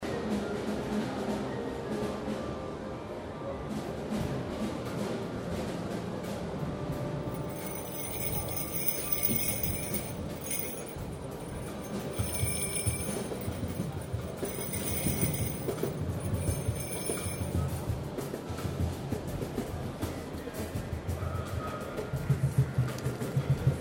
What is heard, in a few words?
messe frankfurt various; fieldrecs